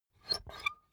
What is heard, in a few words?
puncher squeak punch empty